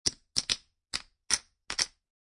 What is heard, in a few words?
Poker Chips Stacking; Poker, gambling, chip: Stacking poker chips.; Card Sound Effects; Casinos; Lynne Music